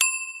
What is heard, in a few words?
star sound; monstersunion